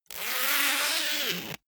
zipper pullover jacket close; objekte; reissverschluss

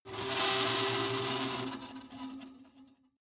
metal screech phaser deep

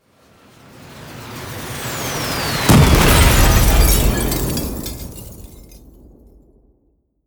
air strike; krieg waffen; flugzeuge

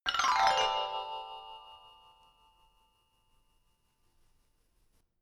notification glockenspiel low slide down hard; instrumente

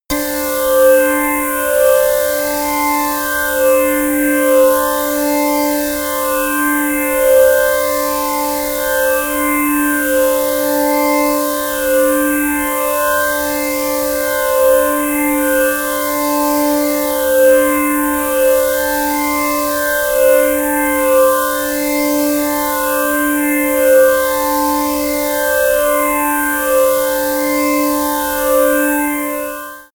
rotating energy, music elements

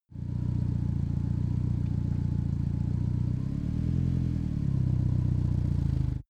car donkervoort d8 gto idle starting

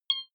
click tonal high soft, signale; kommunikation

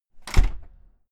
entrance door close slam hard outside

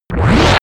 short muddy rise, Risers; whooshes rises

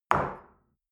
shell place on table hard; berufe; gastronomie; soundpool

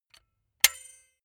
silicone gun bullet lock mechanism heavy; berufe; handwerker; soundpool

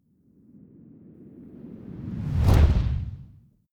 fire whoosh synth big flame; swooshes-swishes

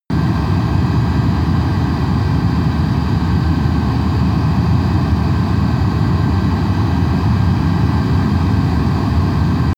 military jet bomber turbine steady low; Airplanes - Jets

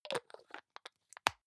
hygiene small screw cup close medium, badezimmer

